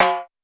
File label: Roland U220 Electric Set, Drum Machines